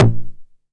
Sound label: 909 SD Tape Sat